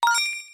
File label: Voltorb Flip Point; Pokemon Titan V2.04; Pokemon Essentials